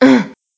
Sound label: grunt female